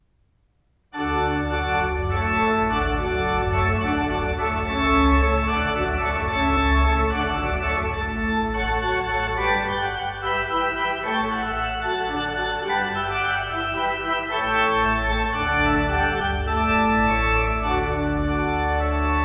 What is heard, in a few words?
Hornpipe